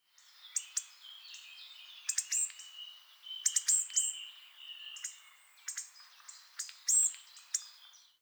Coccothraustes coccothraustes

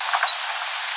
rat USG

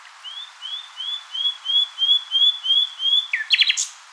nighting

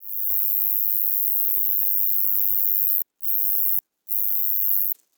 Metrioptera roeselii

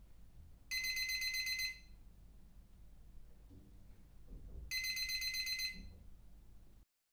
NT1A

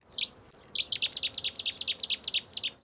Cettia cetti

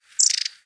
Aegithalos caudatus

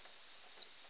mouse juv

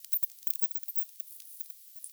Nyctalus leisleri

